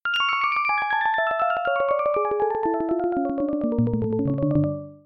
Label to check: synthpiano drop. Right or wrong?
Right